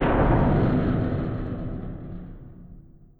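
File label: Collision Exterior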